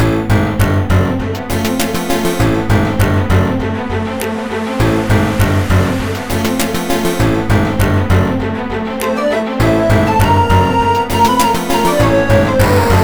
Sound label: bgm dungeon